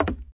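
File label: footstep box; UrbanChaos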